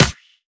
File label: SNARE